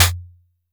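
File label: vermona drm1 mk3 snare drum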